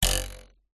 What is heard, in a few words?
cartoon bounce jump ruler twang